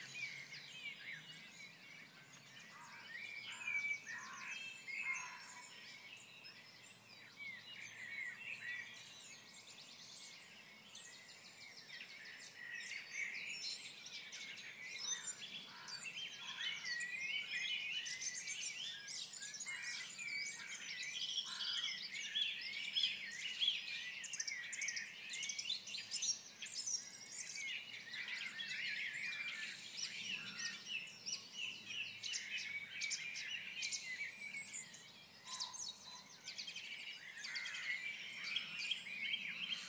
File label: the dawn chorus